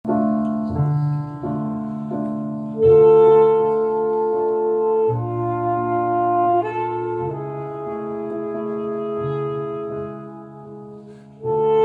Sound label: Sax; huiskamerfestival kwinstheul